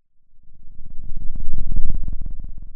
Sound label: pop